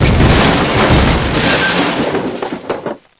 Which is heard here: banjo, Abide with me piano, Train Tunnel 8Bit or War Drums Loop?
Train Tunnel 8Bit